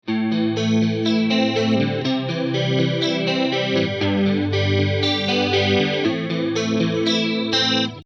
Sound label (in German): gtr chorus bearbeitet